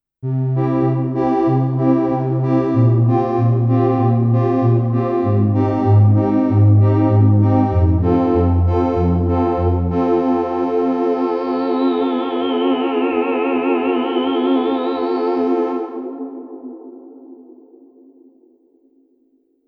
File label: wherehouse melodica